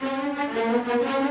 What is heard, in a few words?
GRO, Orchestral Loops; 128 HITCHCOCK